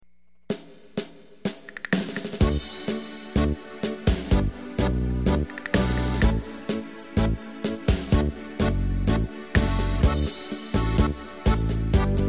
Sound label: tango; yamaha